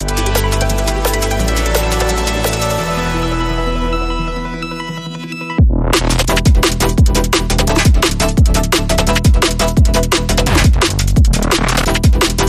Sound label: Breakbeat Eclipse